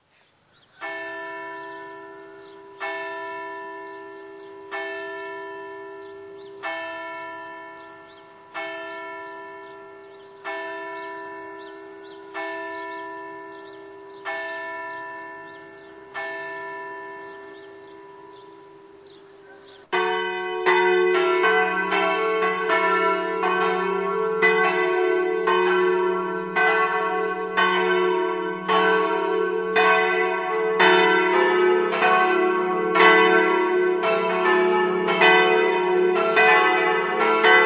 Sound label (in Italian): campane, adorgnano